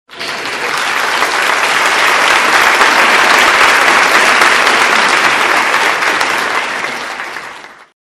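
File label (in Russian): звук аплодисментов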